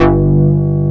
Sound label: Synth Bass, Analog Bs